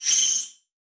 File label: Multimedia Internet CD-Rom Flash High Pitch